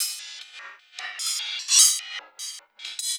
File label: LOOP, 150 BPM, Drum & Bass; Ueberschall; Drum & Bass Flash Trackz